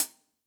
Acousti Hat Hit, hihat; Ultimate hihat samples

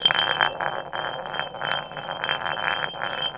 BOTTLE ROLL; Rarefaction; Poke in The Ear With A Sharp Stick

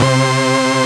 SYN K-2000; Sound & Vision - Gigapack I CD 2

